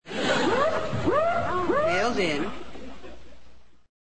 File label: woop woop mail s in